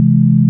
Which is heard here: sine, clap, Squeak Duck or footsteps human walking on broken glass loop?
sine